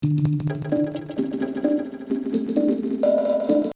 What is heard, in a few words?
130 bip style; Loop Mania Sampler Pack